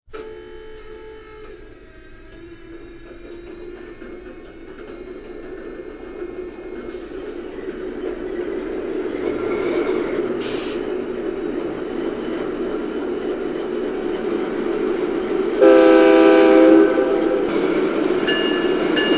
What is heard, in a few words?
GE Dash9